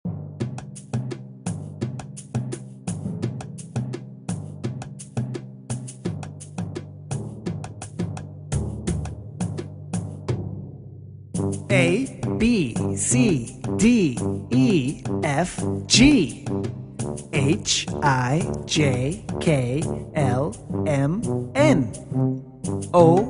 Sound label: The Alphabet Chant